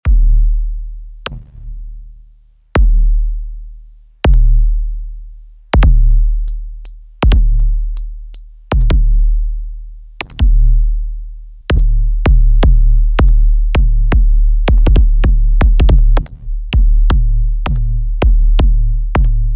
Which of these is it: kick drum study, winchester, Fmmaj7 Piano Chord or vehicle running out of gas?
kick drum study